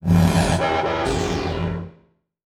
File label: Title Transition Distortion; Adobe; Production Element